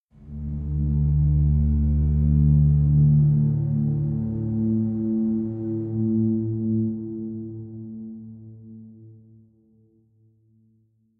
Organ Low

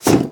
Metal Jump; Devour 2021 gamerip pc macos

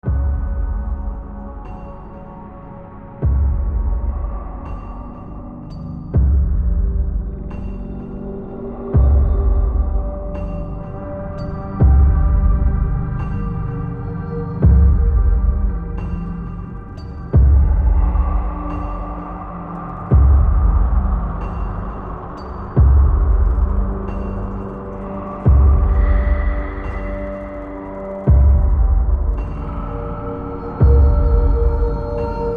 mine surface music; painkiller battle out of hell

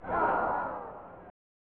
Crowd; PGA Tour 96; SNES

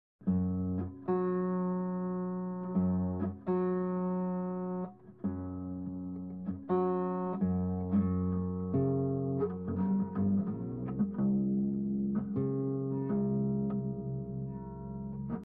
Guitar Muffled; nancy drew danger by design windows gamerip 2006

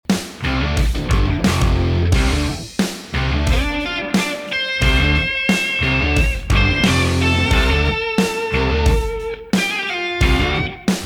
Rock; IKEA Smart Home Planner; Kitchen - Cleaning scene

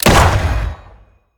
Gun Fire; The Town

